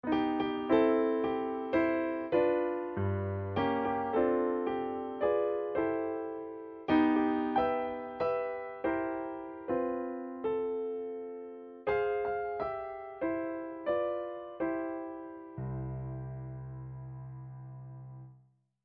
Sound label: Happy Birthday Midi